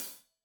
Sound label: Hat Loose, Hi-Hat, Cymbals Stereo